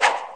banger; Zaps and Beeps

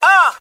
"ah", Voice; ooh ahh

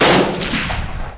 gun shot; Crash Bang Boom

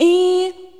eee female, voice; ooh ahh

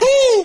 "woo"

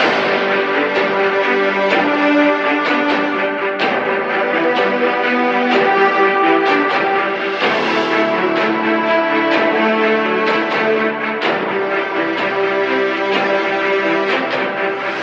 strings; Phonky

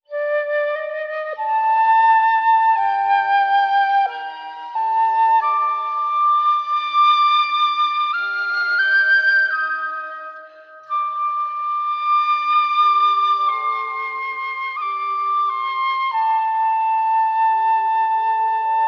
flute, Loops, Sad

